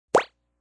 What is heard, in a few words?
Blip; Beeps; multimedia